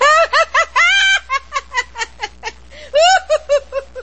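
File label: laughter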